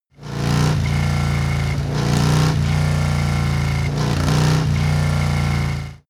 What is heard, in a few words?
motorcycle harley engine rev triple